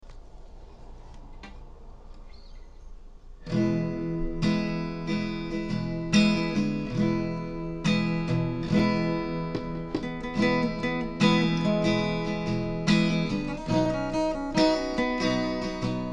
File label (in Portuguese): instrumental